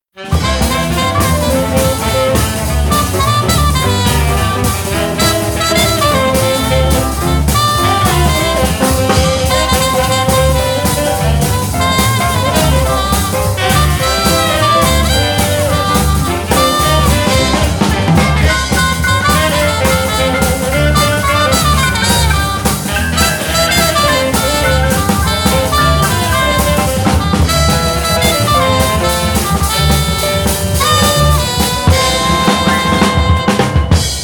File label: Ending theme; Ed Edd n Eddy